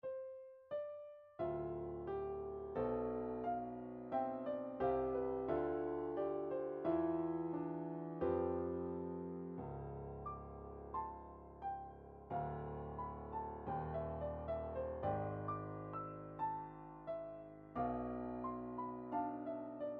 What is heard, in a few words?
ten short piano pieces, midi; shostakovich